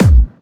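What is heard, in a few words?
BD Clubby, bass drum; VEC1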